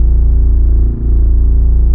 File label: force field hum; voy; dmc